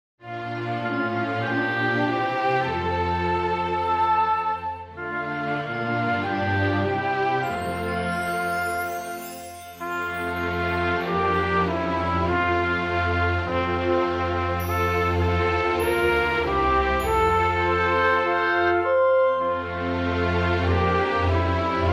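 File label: instrumental version; WSROD NOCNEJ CISZY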